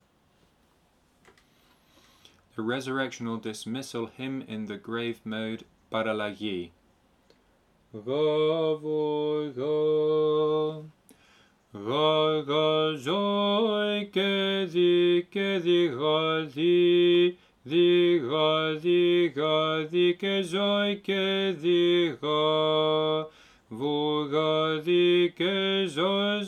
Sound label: Resurrectional Dismissal Hymn Grave Mode; Parallage